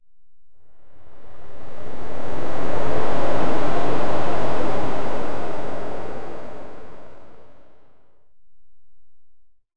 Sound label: a soft wind based whoosh; peak